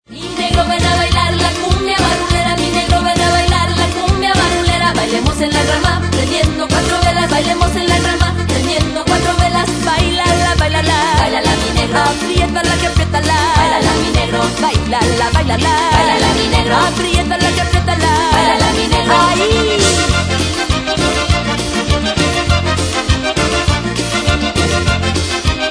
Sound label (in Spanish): La Cumbia